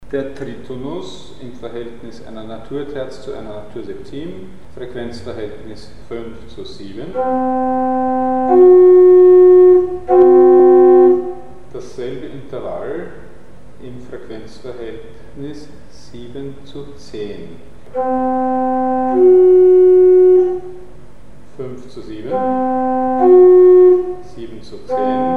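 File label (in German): Größenunterschied zwischen Tritonus im Frequenzverhältnis 5zu7 und 7zu; 7zu10; Mikrobuch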